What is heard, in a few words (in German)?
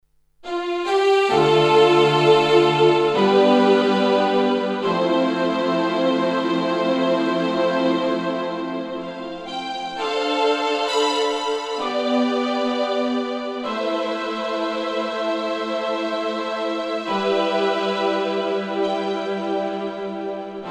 Streicher